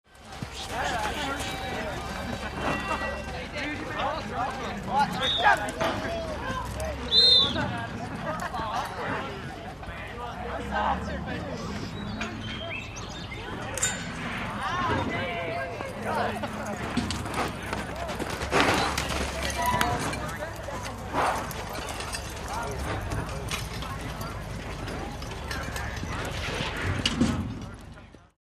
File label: Rodeo Ambience Whist, ambience